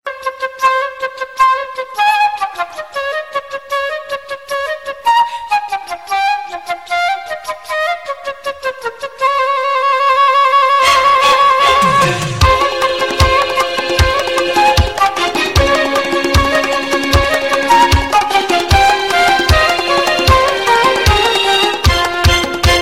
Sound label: soft arabic flute